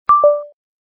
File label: Wireless Uncharging Started; China Mobile; A4s